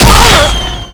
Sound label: crit hit; slender